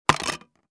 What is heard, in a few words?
CD or DVD single drop hard surface